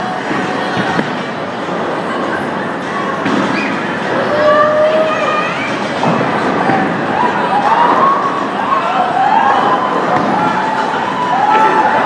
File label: crowdsounds feat bowling